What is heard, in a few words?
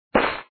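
snowball hit terrain; Raft Wars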